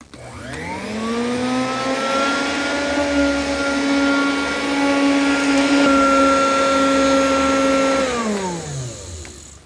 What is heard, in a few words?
vacuum, cleaning; WAV Sound Effects; houshold